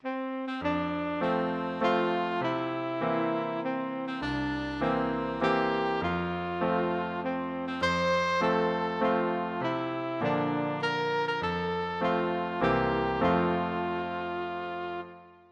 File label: happy birthday, saxophone